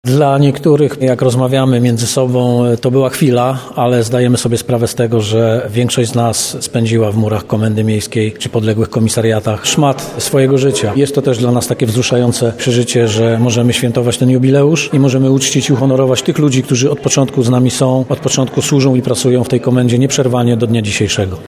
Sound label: funk